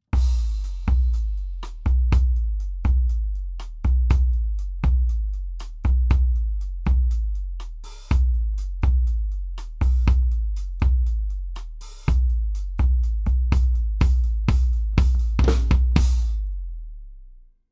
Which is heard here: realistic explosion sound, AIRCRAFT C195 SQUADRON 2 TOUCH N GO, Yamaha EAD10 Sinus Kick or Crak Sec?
Yamaha EAD10 Sinus Kick